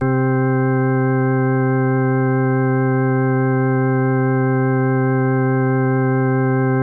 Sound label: ORG C, ORGAN B3 HAM; Best Service ProSamples vol.55 - Retro Sampler; AKAI